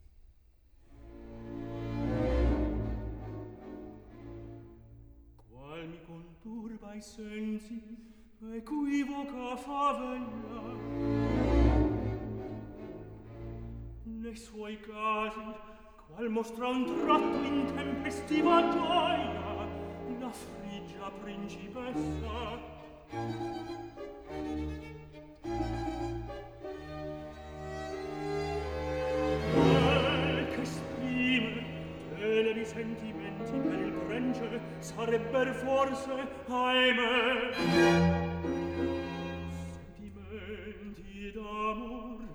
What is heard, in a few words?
opera, Recitativo